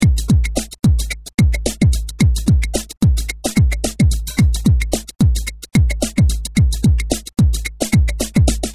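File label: drum loop, underground; elec-pop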